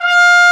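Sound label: Trumpet mf F4; Digital Sound Factory Virtuoso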